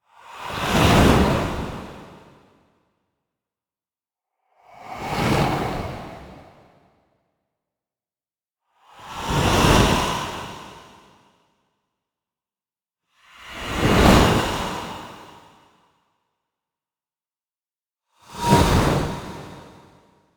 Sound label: whoosh whisper